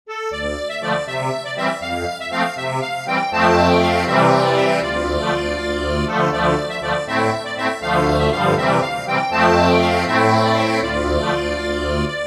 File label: accordion